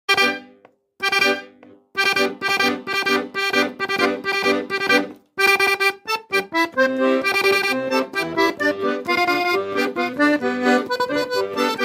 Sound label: Accordion; آکاردئون